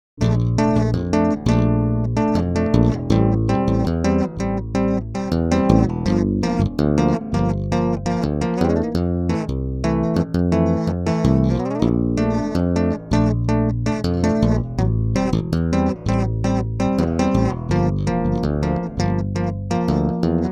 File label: PRS Grainger 4 Strg. 2 Hand Tapping